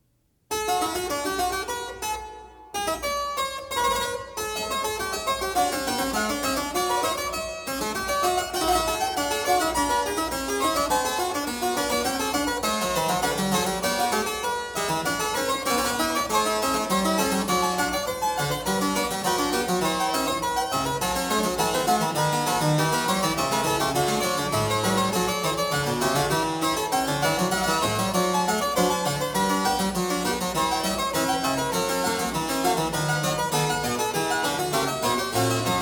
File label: Fugue; Fugue 5; BACH; WELL-TEMPERED CLAVIER; WTC 1